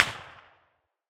far, whizz; tacz-tweak-pack; taczmechanics

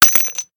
taurus shells; daffas arsenal; tacz

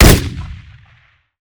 gerosa shoot; daffas arsenal; tacz

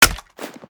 g36 mag hit; daffas arsenal; tacz

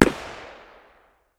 ak medium distance, 5.45x39mm; tacz-tweak-pack; taczmechanics

